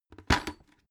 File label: bucket empty put down hard